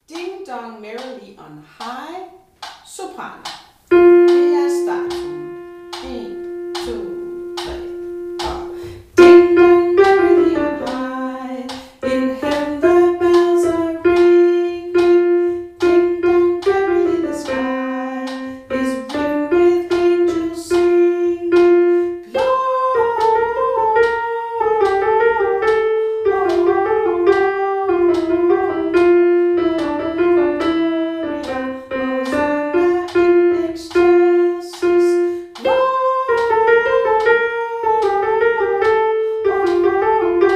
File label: Ding Dong Merely On High sopran